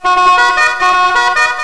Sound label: Delay